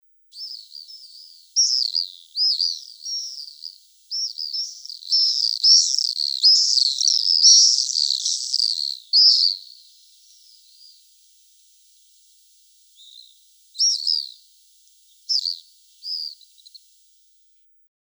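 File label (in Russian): Stirzh belopojasnyj, white-rumped swift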